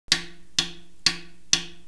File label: rim clix